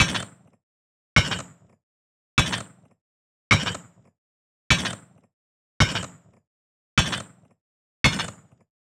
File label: Robot Footstep Metallic Large; Bottle Rocket Fx; Cyborg Collection